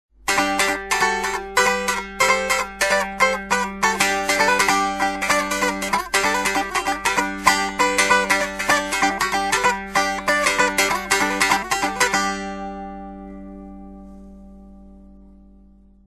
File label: strum; strumstick; flucht